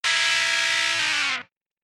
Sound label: Game Over; Voya Nui Adventure; Flash Games; Game Music